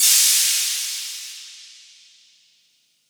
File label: Cymbal; Ableton Live 12 Lite; Core Library